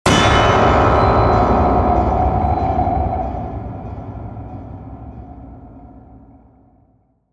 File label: Gods Anvil, sound design trailer tools; Gothic Storm Music; Brain Warp (Sound Design Trailer Tools) 2011